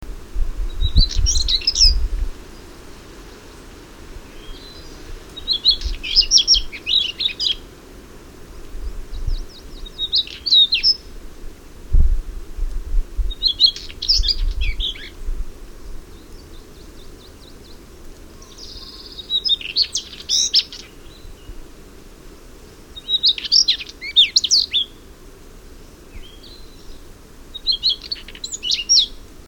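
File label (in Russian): sibirskaya gorihvostka voice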